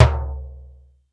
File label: RING, JAZZ; Hollywood Edge; The Big Bad Beatmachine